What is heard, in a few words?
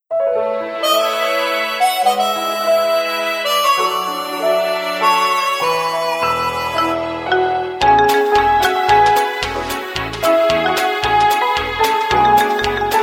Vintage cinema; Samsung SCH-M710 T Omnia II